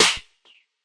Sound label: bap, Hip Hop Specialty Kit; STYLE KITS (Hip Hop, Etc)